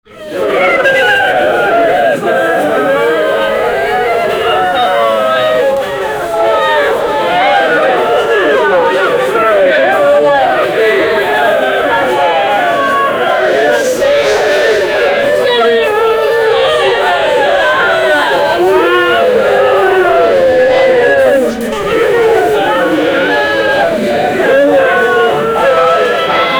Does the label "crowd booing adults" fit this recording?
Yes